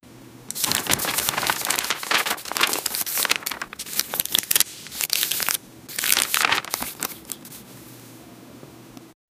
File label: office